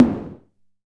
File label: CONGA H; INIS - Funky Xtreams; KR55 KIT